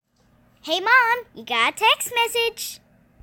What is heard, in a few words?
message